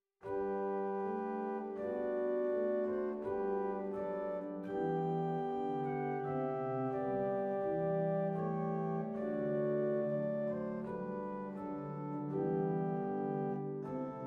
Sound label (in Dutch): Bovenpositief Viola 8; zwolle